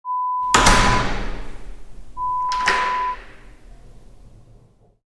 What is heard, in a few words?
Door Slam Lock sound